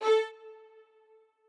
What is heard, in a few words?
strings